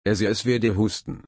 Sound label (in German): husten